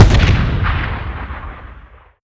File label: burster explode; tf2classic